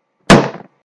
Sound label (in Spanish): jugar ficha